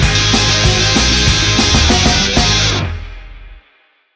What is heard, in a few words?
Music Tennis